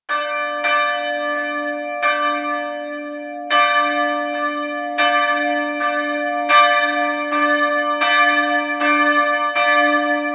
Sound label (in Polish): Sygnaturka